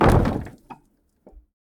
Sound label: push; pirates